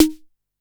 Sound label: Snare High Strung